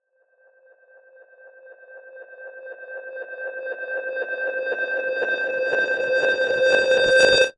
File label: sound design reversed tone long come back consciousness